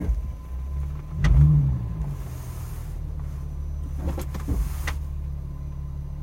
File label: rear seat creak popping noise, popping noise